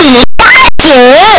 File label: pikachu cry; pokeyellow